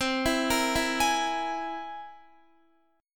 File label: C Augmented 7th, Guitar; C+7 arpeggiated; JGuitar